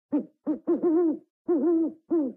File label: owl sound; Minecraft; Votive Content